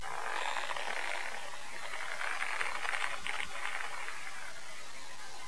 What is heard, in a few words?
climb rope